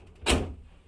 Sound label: car door close; car trunk close